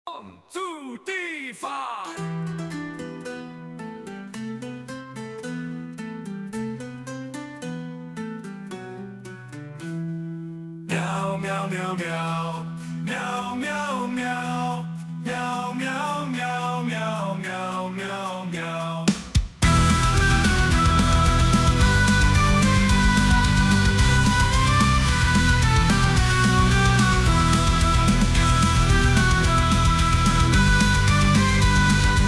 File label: Meow Medieval; MusicBoxAI